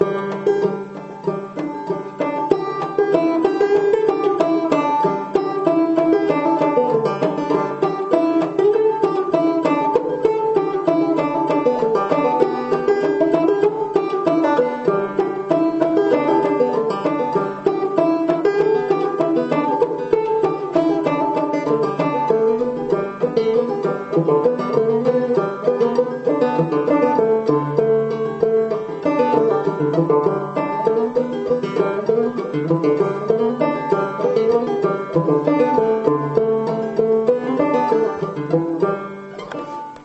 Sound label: Banjo; Roaring River